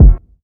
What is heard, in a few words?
strong bottom end kick drum f key; kick drum samples